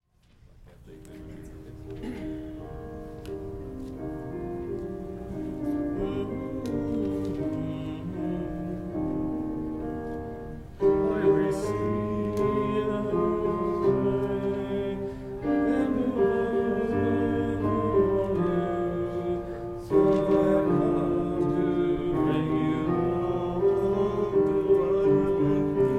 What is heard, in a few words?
I Received the Living God bass and tenor